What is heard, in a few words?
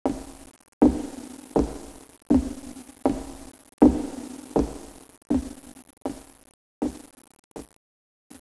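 ambient, halloween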